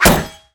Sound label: throw metal, Damage